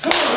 strike; saitama

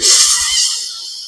sniffing

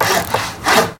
build

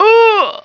pain; portal house

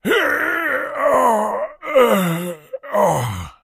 protector death; sven; npcs

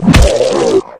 claw strike, zombie

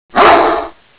bark

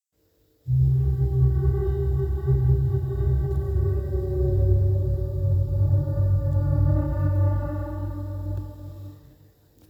Ambiance; Resurgence

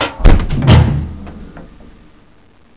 button

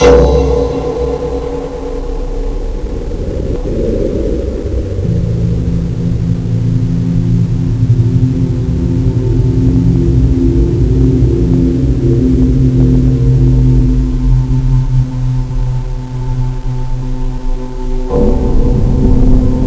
asteroid

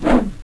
miss; weapons; mace

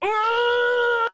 attack; votemap; kani

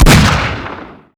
barrel; sven; weapons

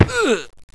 player fall pain

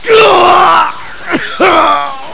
Die; USEC

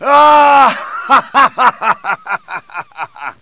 ahahash

